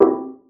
multimedia stop incorrect alert; dustyroom

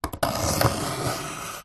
office compass pencil draw circle on paper

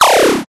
science fiction laser fire

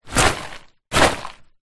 popcorn paper bag full open shake 2x

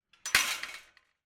industrial metal gate close

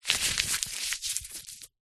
foley paper receipt screw up

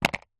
food candy bubblegum tape plastic tub set down

